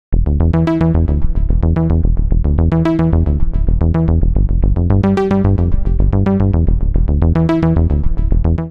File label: SynBass (E) 110bpm; Music Loops; Movement; Ableton; Singularities